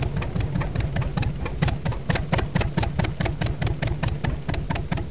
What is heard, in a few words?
TRACTOR, wheels